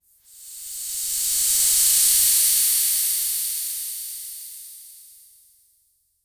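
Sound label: scare sizzling hiss brief; sizzle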